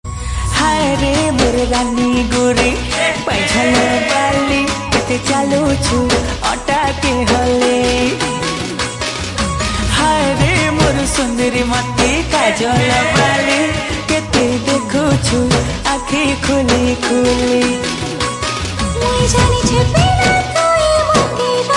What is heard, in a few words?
Sambalpuri Song Ringtone